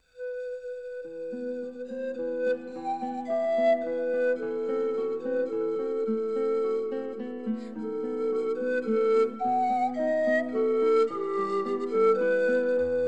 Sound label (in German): Gitarre